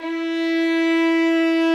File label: guitar violin